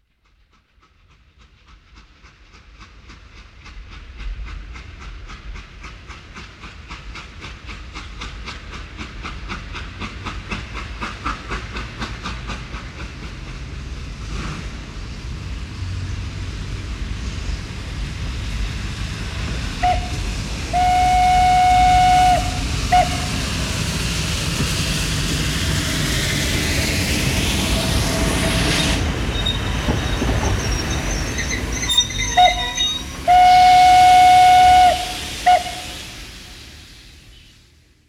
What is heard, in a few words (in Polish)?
pociąg